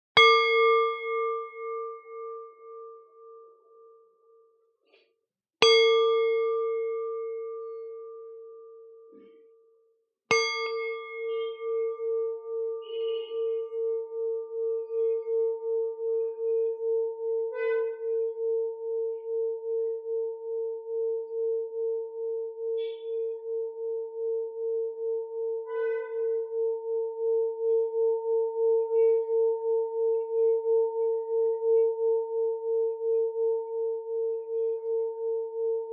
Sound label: Blue Singing Bowl